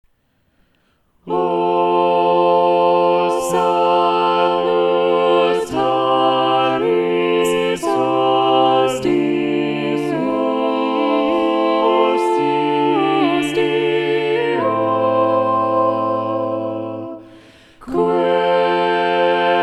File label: SATB Balanced Voices; O salutaris hostia; Pierre de la Rue